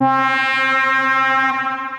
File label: Dramatic Fanfare, Synth Lead; Ableton; Creative Extensions; Racks